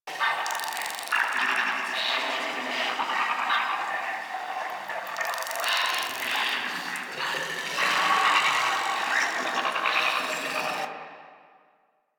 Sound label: Landscape Mushroom Forest Loop; Warframe; Ambience; Venus Open World; Positional Stereo Loops